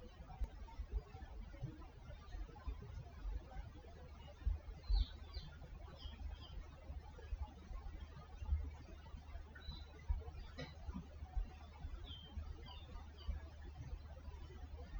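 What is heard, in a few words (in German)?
komische Geräusche; Mikrofon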